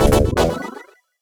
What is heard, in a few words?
Jingle Amazing Strike!!; StreetPass Mii Plaza; Ultimate Angler